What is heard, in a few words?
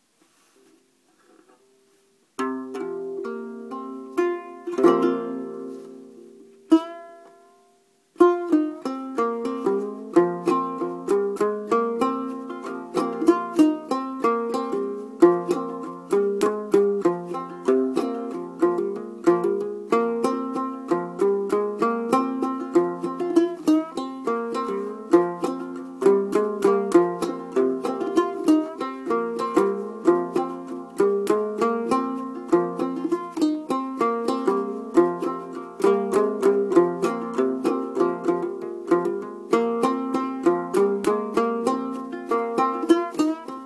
Gourd banjo